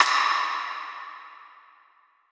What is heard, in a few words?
snare wind; Drums; One Shots; Slate; AURORA Sample Pack; Snares